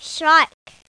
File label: FISHTYPE